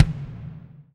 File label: BD, DR BD; Best Service Gigapack 2